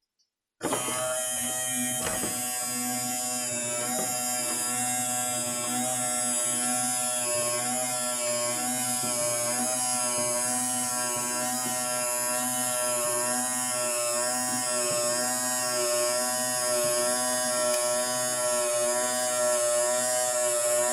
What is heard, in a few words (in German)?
dachfenster elektrisch oeffnen weiss; dachfenster elektrisch oeffnen mit vega